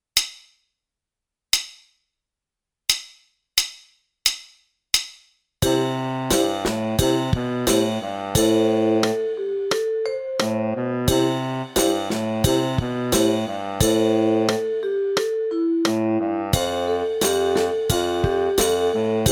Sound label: Dreamy Dance Track